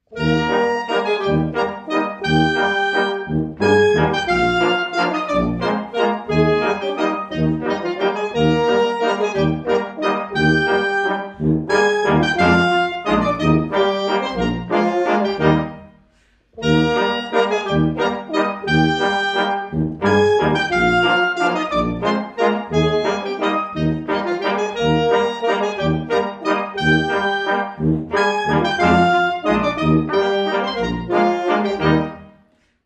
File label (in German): Walzer